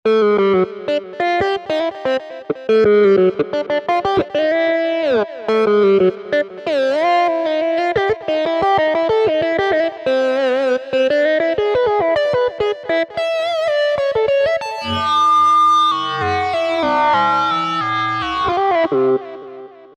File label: Nah Lead, Roland V-Synth; Factory Patches